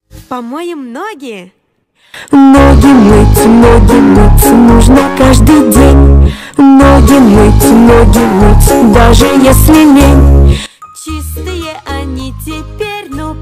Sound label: bass boosted